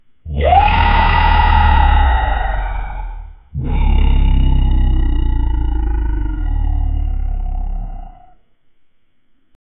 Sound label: thunderous roar demonic; abyss